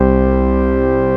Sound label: Casio VZ-10M Hard Organ C2